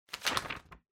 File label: Flyer Rustle